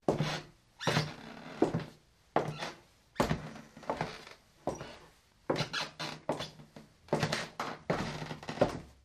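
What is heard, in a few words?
Footsteps Interior Wood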